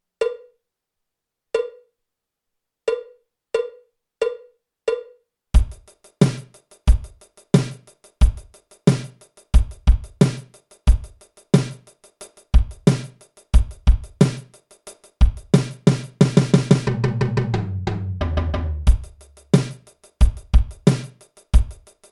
架子鼓独奏 芬克摇滚风格; 66条架子鼓独奏SOLO

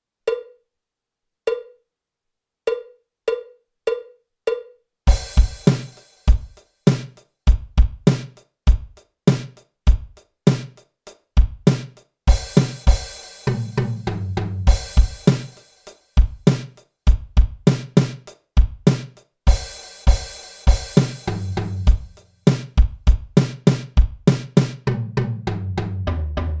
架子鼓独奏 流行摇滚风格; 66条架子鼓独奏SOLO

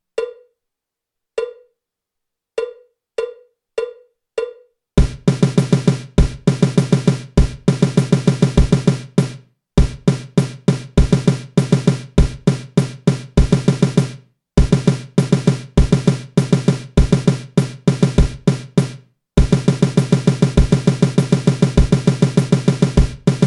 架子鼓独奏 热身独奏; 66条架子鼓独奏SOLO

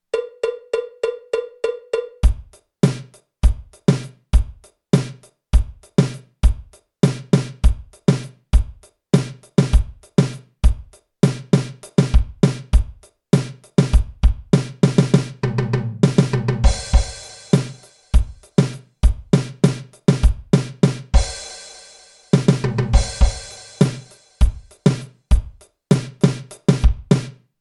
架子鼓独奏 八七拍; 66条架子鼓独奏SOLO

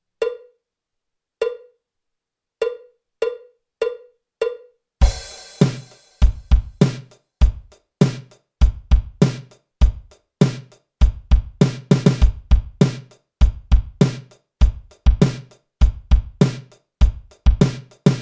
架子鼓独奏 摇滚融合; 66条架子鼓独奏SOLO

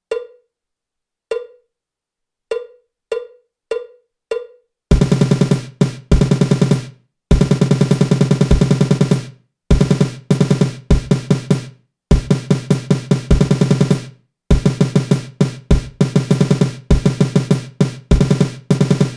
架子鼓独奏 热身独奏; 66条架子鼓独奏SOLO

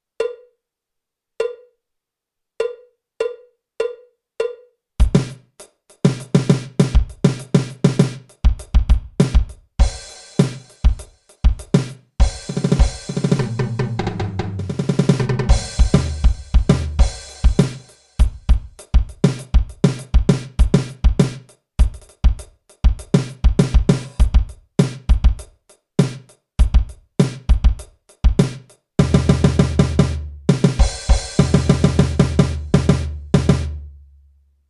架子鼓独奏 爵士融合; 66条架子鼓独奏SOLO

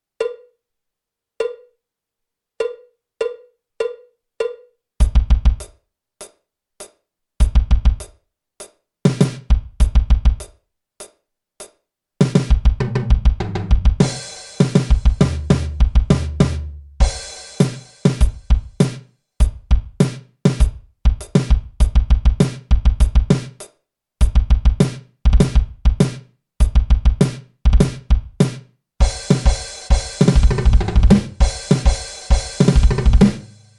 架子鼓独奏 硬摇滚; 66条架子鼓独奏SOLO

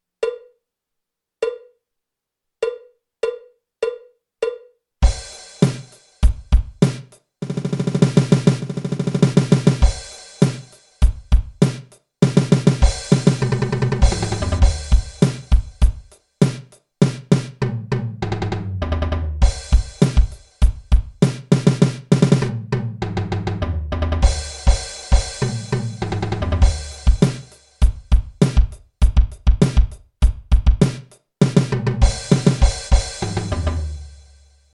架子鼓独奏 芬克摇滚风格; 66条架子鼓独奏SOLO

